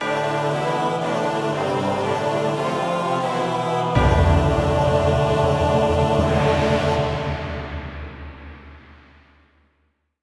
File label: Lose Duel; Shell LoseDuel; manalink